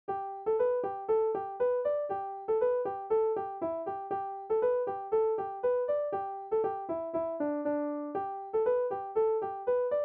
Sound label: G Major, irish music; britches full of stiches